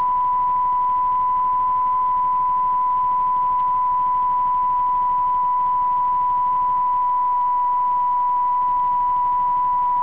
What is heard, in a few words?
18dB above baseline